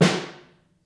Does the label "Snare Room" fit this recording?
Yes